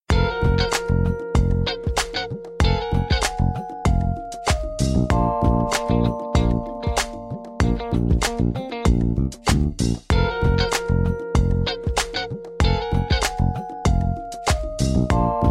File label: Dorian Flat 2 Groove